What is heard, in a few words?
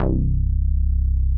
MINI, MOOG BASS